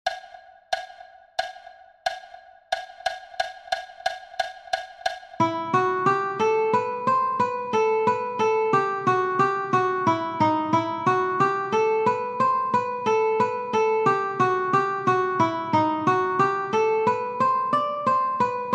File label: Gypsy Gtr 90bpm